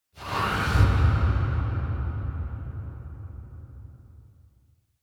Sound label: Impact Distant Deep Reverberant Breath; Hollywood Edge; sound designer's toolkit v2